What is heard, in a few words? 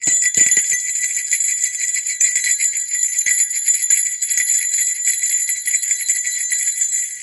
bear bell